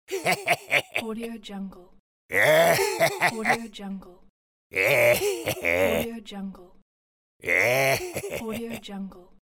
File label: old man laugh